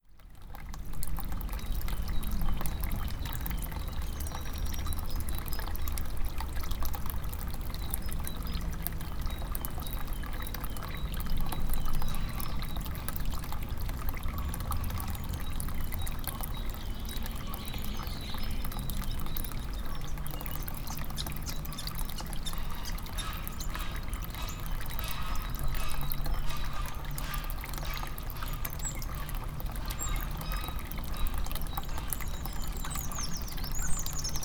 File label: ambience